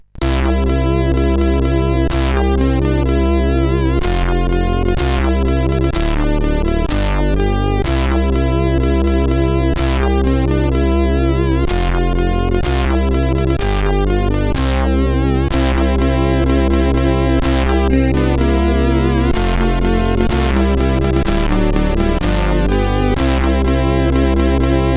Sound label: Jingle Bells, CSG8580R5